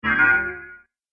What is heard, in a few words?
Weird Impact Bell; Miscellaneous Impact Sound Effects; Andrew Fleming